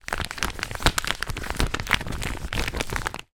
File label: foley plastic photo wallet sheet movement; zapsplat